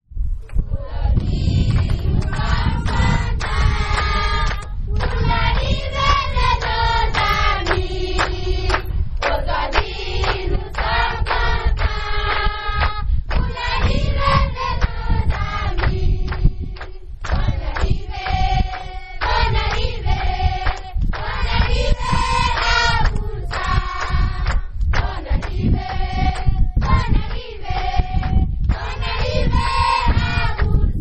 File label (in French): accueil avec le chant des enfants; voyage au burkina janvier 2016